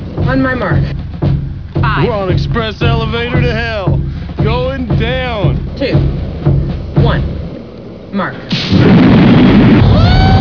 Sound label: elevator; aliens